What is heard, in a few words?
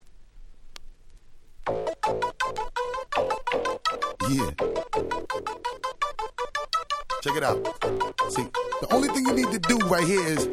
break